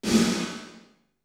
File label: REAL ROOM